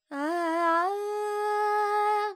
Charukesi, Vocals, Carnatic Female, Alaps, G, Dry

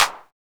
Clap, Percussion; Sample Banks

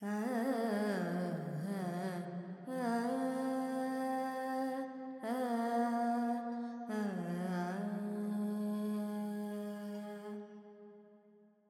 Pentatonic, Vocals Carnatic Female Alaps 70 G

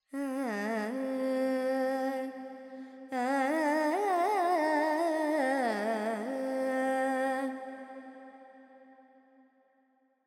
FX Purvi Kalyani, Carnatic Female Alaps

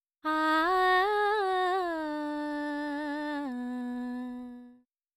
female vocals dry 4-4 Bm 100; Sample Banks

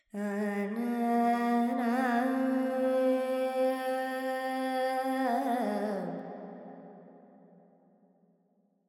Carnatic Female Alaps, Lydian, 70 G, Verb; Sample Banks

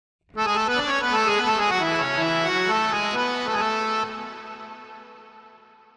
FX, Harmonioum, 3-4, DM, 128; Melody; Sample Banks

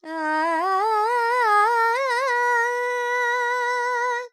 Phrygian, Vocals, Carnatic Female, Alaps, Dry; Sample Banks

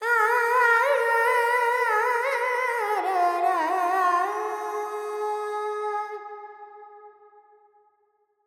Verb Dorian, Vocals, Carnatic Female, Alaps, 70 G

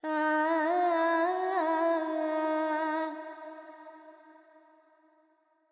FX, Vocals, Carnatic Female, Alaps, Charukesi

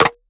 Perc; Sample Banks; Percussion

